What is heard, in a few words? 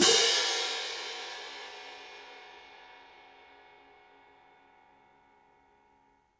CYM ORCH, Orch Crash; Roland LCDP03 Orchestral Perc; Orch Cymbals